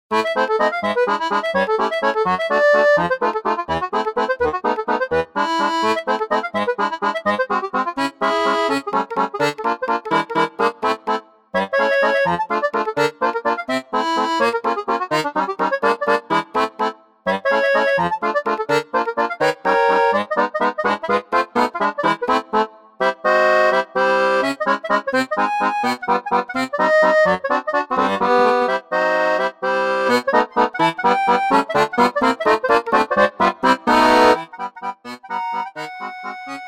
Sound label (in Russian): vals mazurka